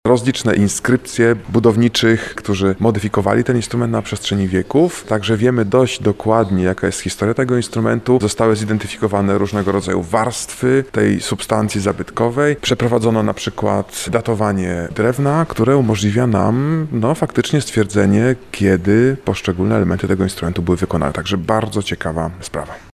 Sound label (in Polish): organy; zlotypotok